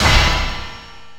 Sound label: gong shot; svencoop; twhltower